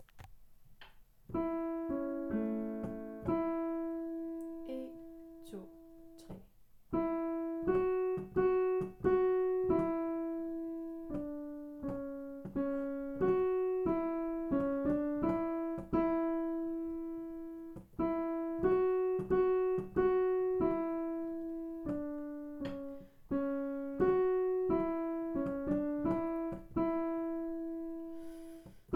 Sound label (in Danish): ALT uden sang